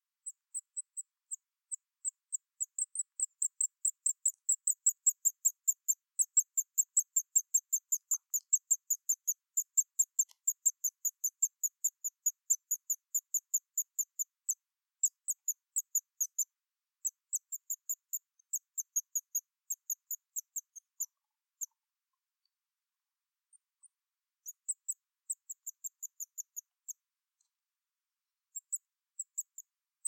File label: Common vole; ول معمولی